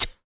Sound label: FEM PERC, Vocal Jazz FX